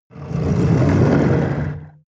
metal roll

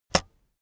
keyboard external space release heavy; kommunikation

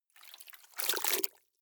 clothes water updown soft, haushalt; haus garten

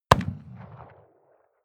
revolver shot 44cal remington 25m rear

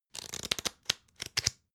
playing cards browse

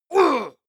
male combat hit soft; soundpool

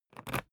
briefcase plastic latch lock key pull out ringing

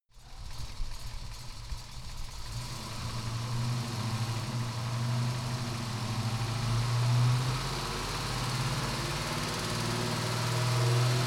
car camaro accelerate heavy engine

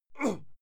male fight yell muted; menschen; schreie

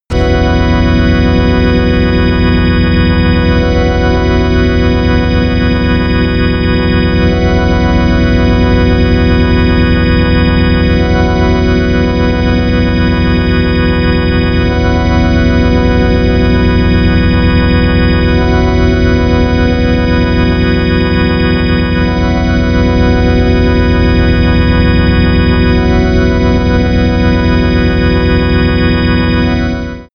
organ air

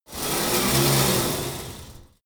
pullup machine cable pull fast; sport freizeit; fitnessstudio

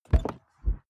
car bmw e46 interior door rear open short; Cars

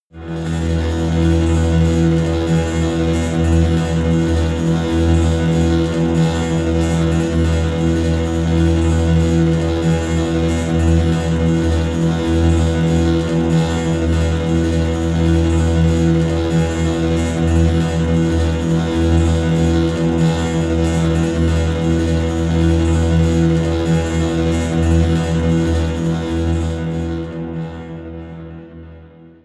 drone erratic pulse

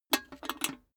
cash box metal lift handle; maschinen; soundpool

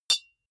deodorant hit, metal; maschinen; soundpool

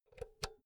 power cord insert hard; objekte; elektronik

